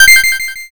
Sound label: notify